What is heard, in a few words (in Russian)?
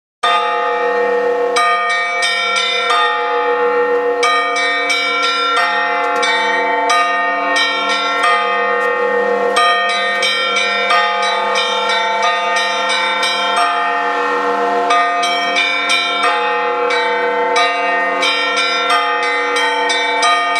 zvon